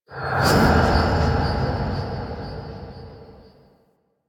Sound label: UI Customization Menu Open; Warframe